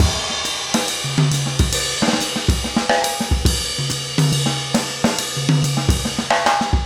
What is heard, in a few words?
trip jazz 140